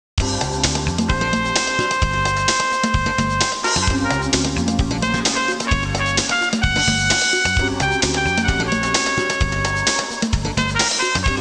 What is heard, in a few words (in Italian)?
suonerie musica jazz